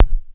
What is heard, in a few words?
Bass Klik, Kick Snare Layers